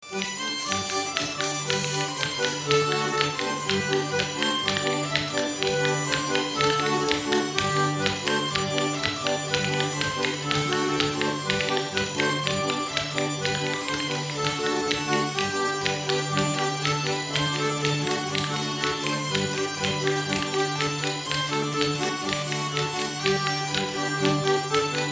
suite polkas

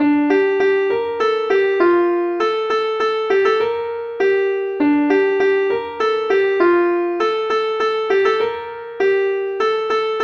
suite passes pieds

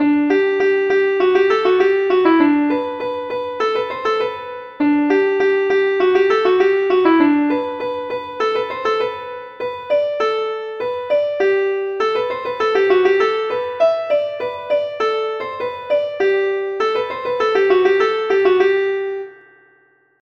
pas de sept

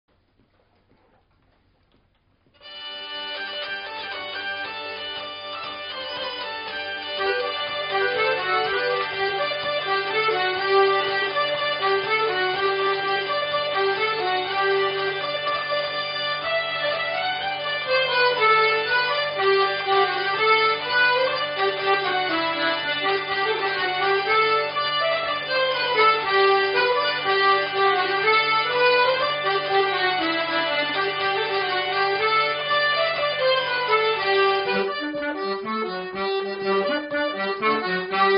polka, vielles

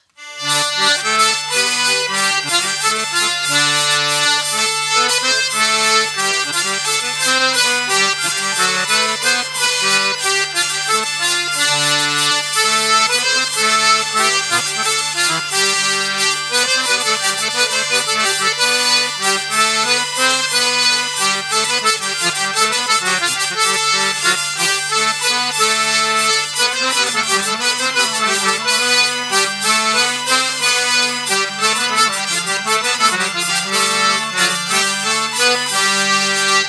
valse

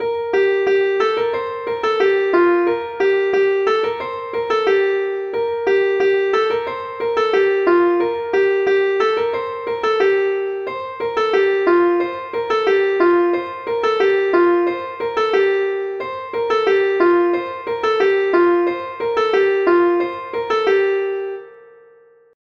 suite ronds

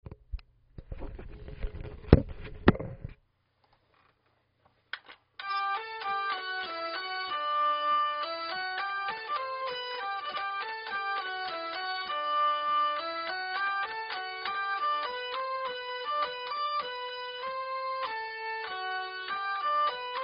polka contrechant; paimpol

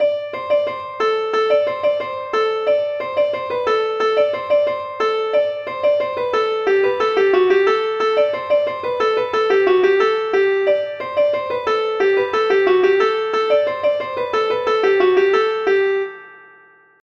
suite ronds

